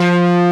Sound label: E-2, GUITAR; AKAI S-Series CD-ROM Sound Library VOL-8